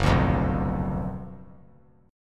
Gb Major 7th Suspended 4th Sharp 5th; GbM7sus4#5 strummed; JGuitar

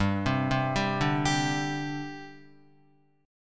G Suspended 4th, Guitar; arpeggiated; 2,3,0,0,2,3; 4F,4A,5C,5G,5C,6E; JGuitar

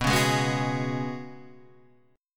F sharp Suspended 2nd Suspended 4th B

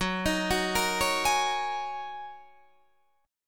Gb 6th Add 9th Gb down Guitar Open D

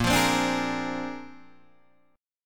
A sharp 9th A sharp, Guitar Open D

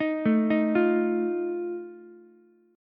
D sharp Suspended 2nd Flat 5th, Ukulele; arpeggiated; JGuitar

